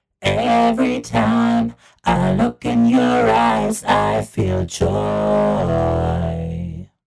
Vocals Dark; Richard Devine; Dark Moder

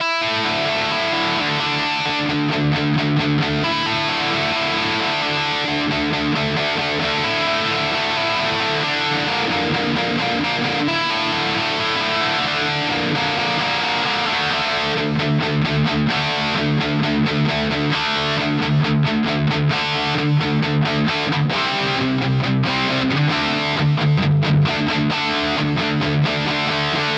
Harley Benton DNAfx GiT Amp Mark V

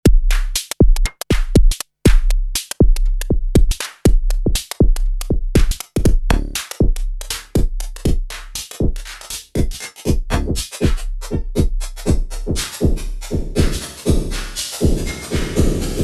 volca drum Metal

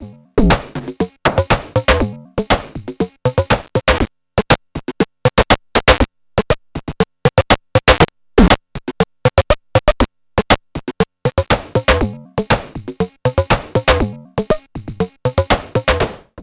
Transient Plus Distortion; Future Retro

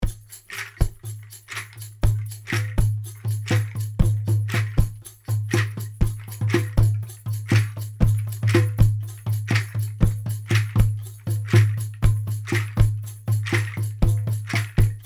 AEA R88 II Percussion Beat

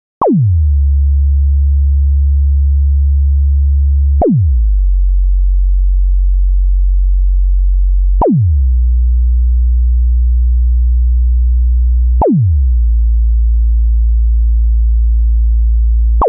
TAL U No LX Kick Sweep

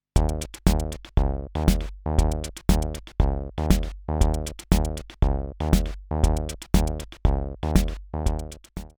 Groovebox; goldmike mk2

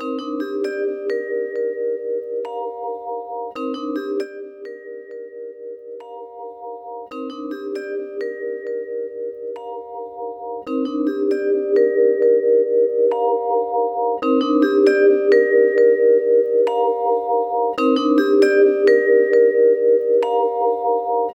Vib EQ; UA HItsvilleEQ

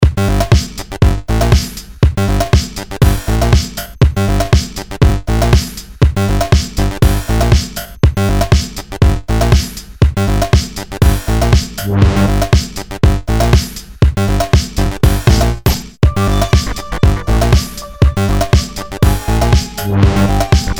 Progressive House; Big Fish Audio

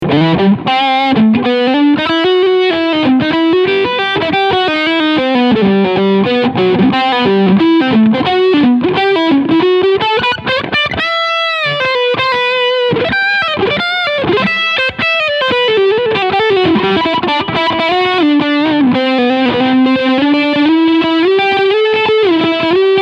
PRS SE Mira Overdrive Bridge PU

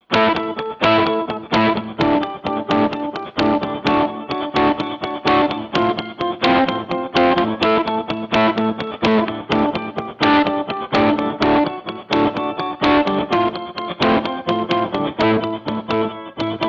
classic rock

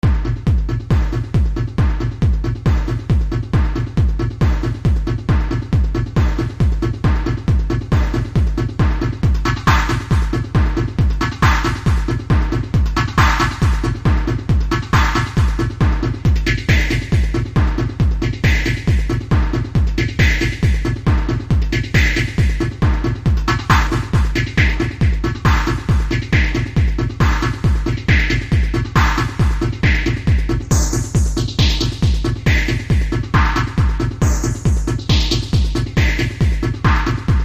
filter

